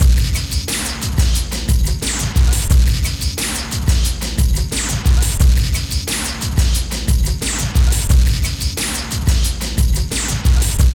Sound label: LOOP, Tekno Industrial; East West